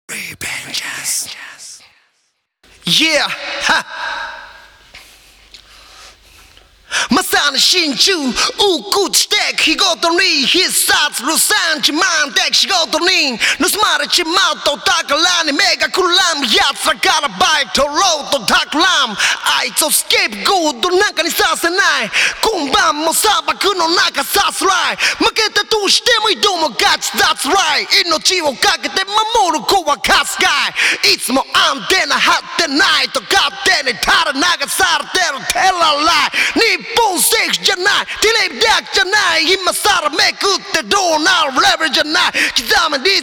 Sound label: Acapella